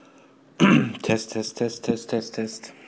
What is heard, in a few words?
aaaaaaarghhh